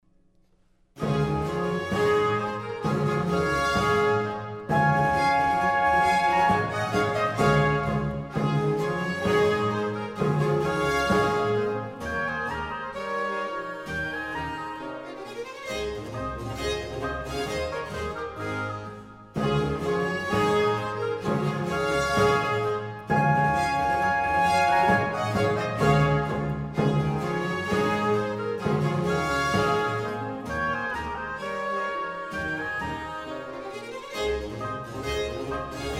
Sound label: Ouverture No. 4 in D major BWV 1069 3. Gavotte